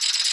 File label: Zip Line Wire Loop; SFX Kit